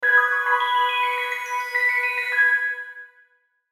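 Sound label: Musical Electric Keys Rhythm; Keyboard; Blastwave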